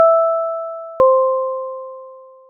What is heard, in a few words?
doorbell; 2.5s; human